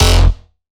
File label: Bass F, Bass Synth